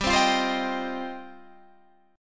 G 7th Suspended 4th Sharp 5th G, Guitar Half Step Down